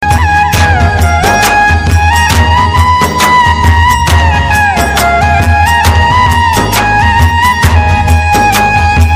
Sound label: Bansuri Ghoomar